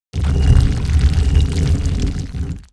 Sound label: door die; 흑룡결 GATE